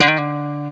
BLU TRIPLET, Guitar & Bass; 90 s Sample CDs; Quantum Leap - Guitar & Bass

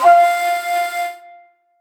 shaku, leads; Polyend; ARC Noise

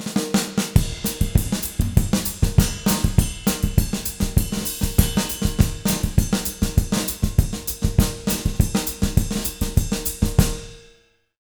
200 SAMBA, Jazz Latin Drumloops

